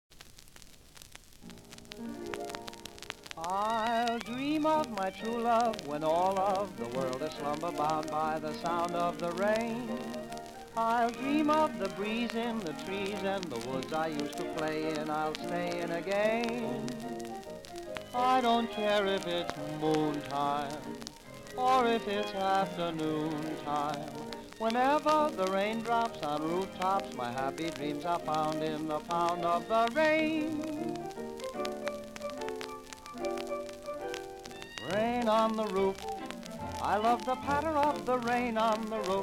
Orchestra; Popular Jazz Archive 1920-1936

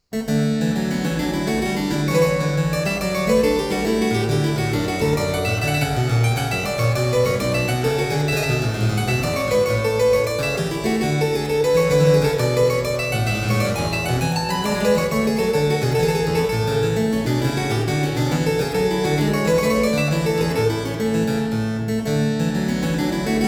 French Suite No. 4 in E flat major; Bach; BWV 815; II. Courante; The French Suites

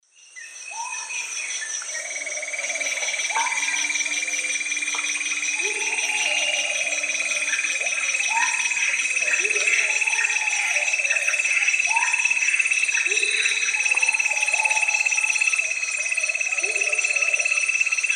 Ancistrops strigilatus, song; S. America; Eastern Lowlands

